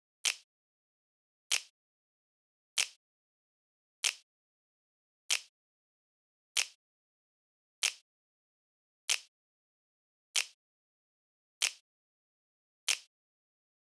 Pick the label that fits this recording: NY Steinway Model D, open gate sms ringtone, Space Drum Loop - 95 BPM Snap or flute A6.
Space Drum Loop - 95 BPM Snap